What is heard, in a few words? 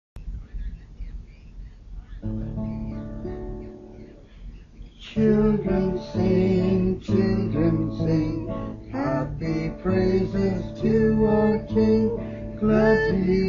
CHILDREN SING